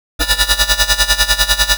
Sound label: Phase Plant, bass